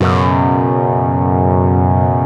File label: AQUA TARK, Synth; InVision Interactive; Keith Emerson; The Most Dangerous Synth and Organ; SYNTH LAYER